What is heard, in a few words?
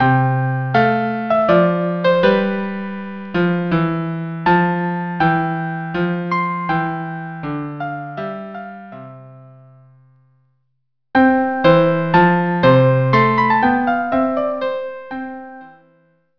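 violin cello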